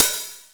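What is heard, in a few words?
hi-hat open, drum machines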